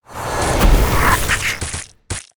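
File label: 4 leg skeleton spawn, monster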